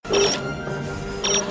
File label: time circuits sound; BTTF2; Back to the Future 2